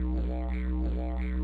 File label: DIDGERIDO, Loops; Yamaha DTXpress-II